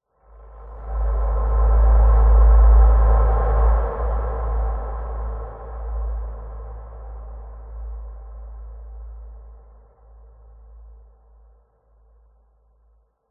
sound design airy blowing tone long reverb eerie desolate; zapsplat